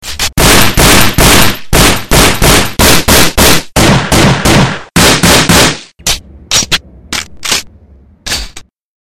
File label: fire war ringtone